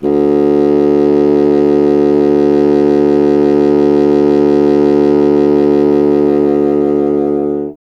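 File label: wind contrabassoon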